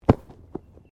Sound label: Body movement Fall Medium